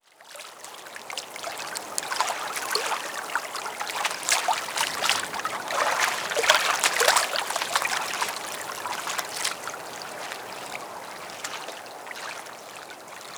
environment water quiet docks

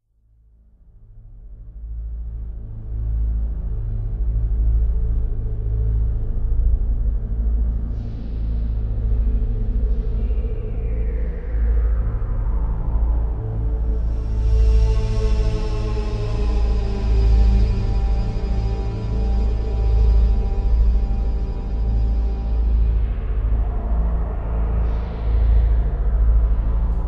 Trance; Gabriel Knight Mysteries; Gabriel Knight 1-3

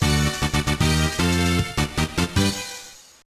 Final Boss Clear; one piece; gba

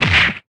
punch short

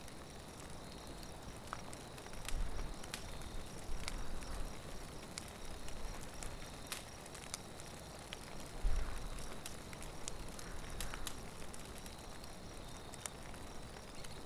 Main Menu Loop; Toussaint

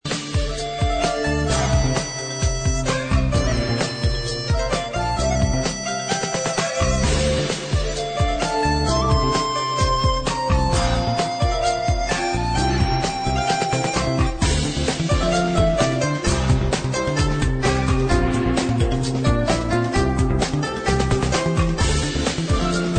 Battle; Dice Fighter; Android

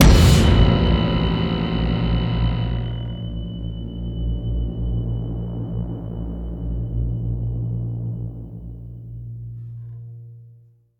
sting Shock; the walking dead michonne 1 in too deep

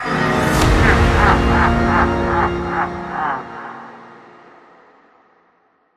Mission Failed (Halloween Remix); modern combat 5 blackout; android

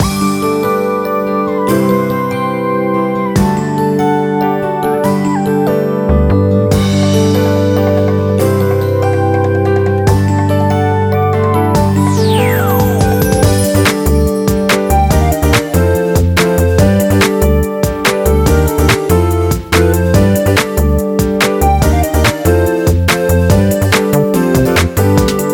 Music English Classic Countryside; Let's Golf 2 HD

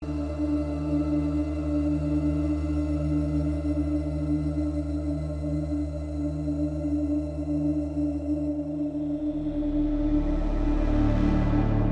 Cinematic Synth Puzzle Loops; Mass Effect Andromeda

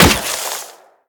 Gunshot Miss; The Town

